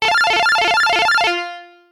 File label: all letters complete, pinball